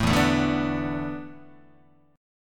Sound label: E 7th Suspended 4th, Guitar; E7sus4; strummed